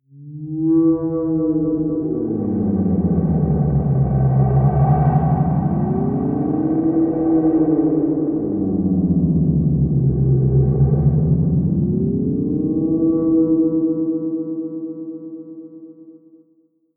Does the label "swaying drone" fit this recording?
Yes